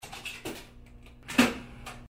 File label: vending; gmod; paris